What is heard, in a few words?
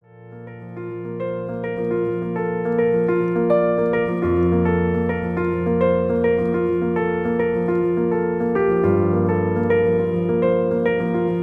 bgm